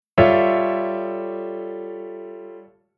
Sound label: Chord Hybrid